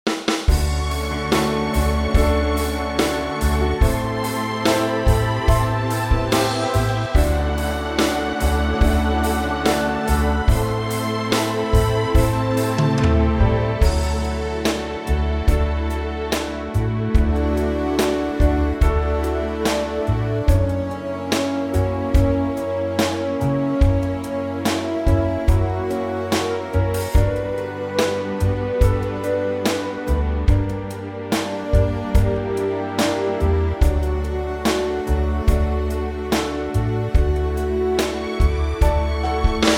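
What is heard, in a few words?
orchestra D; Orchestral; key - D - range - A to A; Gorgeously EPIC arrangement; Slower tempo, BIG Orchestra, big drums, choir included!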